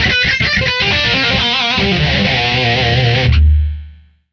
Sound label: Guitar Shred